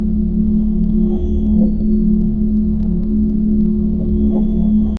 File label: futuristic city ambience